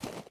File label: Walking Step